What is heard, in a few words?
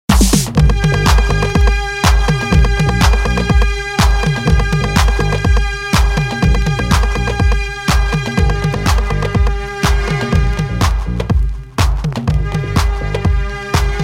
fusion afro mix drum